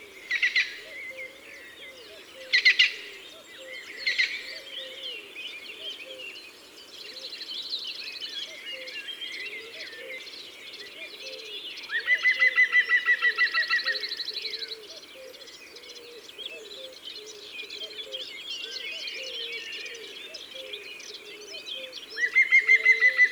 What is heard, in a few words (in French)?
Pic vert ABC des chants d'oiseaux